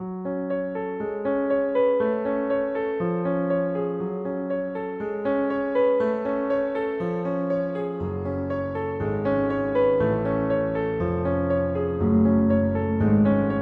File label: Turkish March